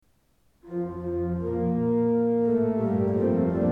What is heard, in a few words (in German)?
jodel